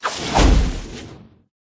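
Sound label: pterodactyl buff; pterodaktilj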